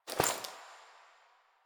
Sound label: Body Move; Frumentarius; Warframe; Ambience; Nineteen Ninety Nine; DE